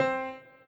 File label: piano intro